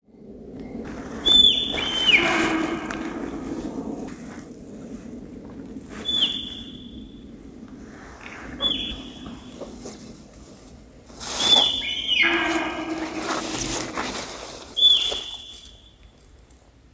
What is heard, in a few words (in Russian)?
птица